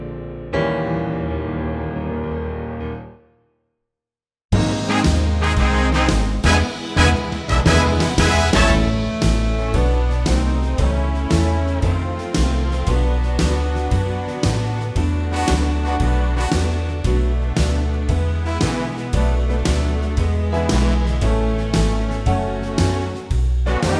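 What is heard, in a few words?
Ab A Bb into big band, backing track; the band kicks in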